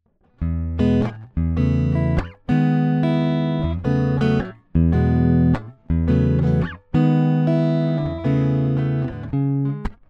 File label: Jazzmaster DI clean